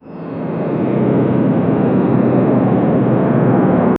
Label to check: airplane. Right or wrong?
Right